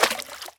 explosion liquid

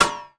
impact metal; Epic Toon FX

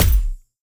explosion sparkle

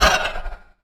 shoot soul; Epic Toon FX

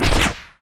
shoot rocket

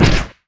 shoot rocket

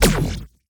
shoot energy; Epic Toon FX

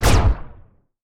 explosion plasma; Epic Toon FX

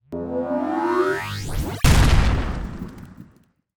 explosion chargeup; Epic Toon FX

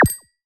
explosion mini magic

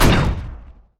shoot nuke; Epic Toon FX

